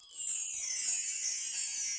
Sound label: Chimes B Finger dn, Latin Percussion; Drum Hits; Bell; Ableton; Factory Packs